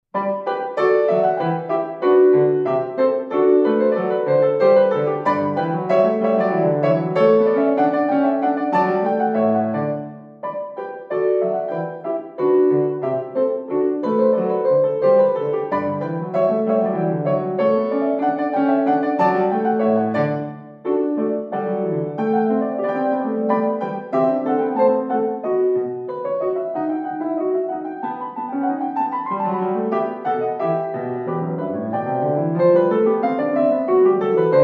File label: gavotte allegro